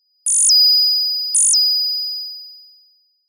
ringing sound; standard ringtones

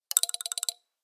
stirring drum fast; wood tones

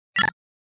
glitch, technology sounds

